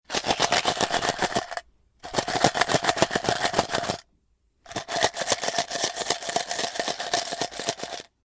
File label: coin shake